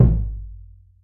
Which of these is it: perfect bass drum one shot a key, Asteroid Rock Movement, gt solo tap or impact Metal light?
perfect bass drum one shot a key